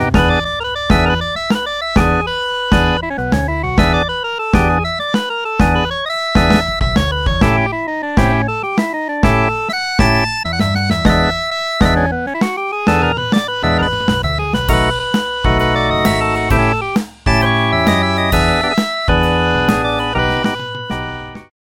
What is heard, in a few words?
blues organ